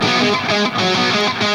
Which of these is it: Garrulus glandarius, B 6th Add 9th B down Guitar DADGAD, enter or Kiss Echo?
enter